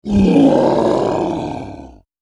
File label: big roar from giant; sound ideas